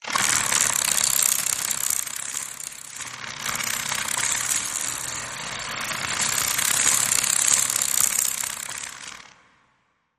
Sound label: Fairy Flight Flutter